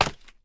脚手架踩踏声

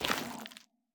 幽匿踩踏声

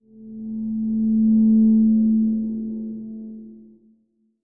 诡异森林氛围声; ambient

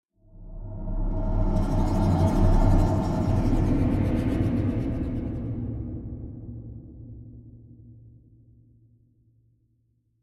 玄武岩三角洲扭曲声; ambient

